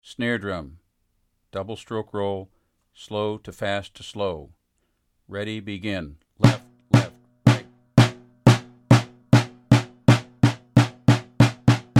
Double stroke roll

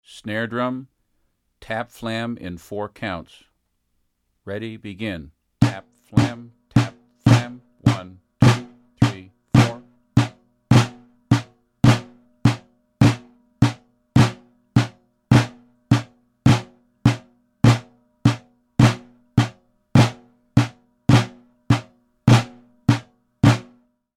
Tap flam

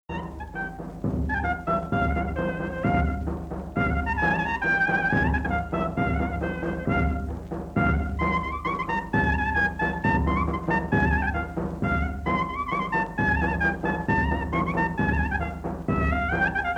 Karsilama dance

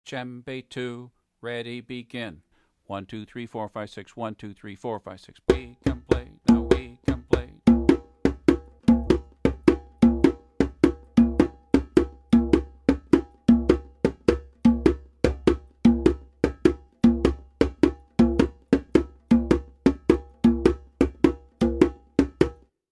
Doundoumba Djembe

